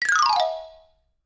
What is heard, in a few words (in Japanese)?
場面切り替え 木琴 下り